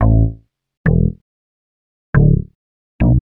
Hard Bass; Sonic Foundry (Sony Creative Software); Knutrix Drum & Bass

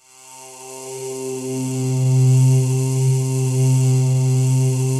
HOARSE C2, trance

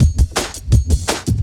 New Wave Cut 1, Drum Loops, 167.5; Zero-G Jungle Warfare 1, 2 & 3; 90 s Sample CDs

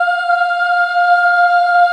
F4 child ah, choir; USB Soundscan vol.28; Acoustic & Synth; AKAI; CHILD AHF

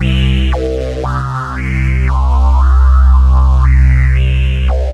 SYN BASS

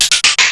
Tsk Tsk, Drum&Bass Hits & Bits; Total Drum&Bass; 90 s Sample CDs

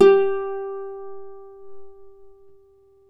Celtic Harp; E-MU Producer Series Vol. 7 – Old World Instruments

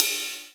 Ride, Roland R-8

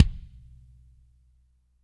CMP KIK, DRY KIT; Spectrasonics; Burning Grooves CD2; DRY KIT 4

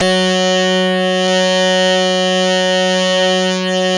SYN Ana Lead; Best Service Gigapack 1

